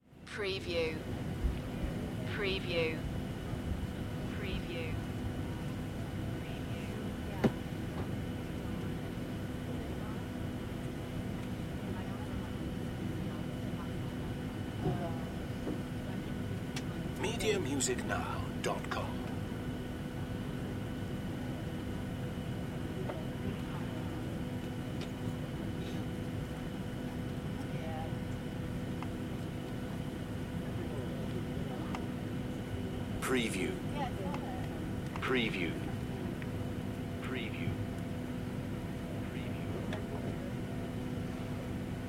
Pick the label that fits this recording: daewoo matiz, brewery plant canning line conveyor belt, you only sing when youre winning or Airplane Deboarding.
Airplane Deboarding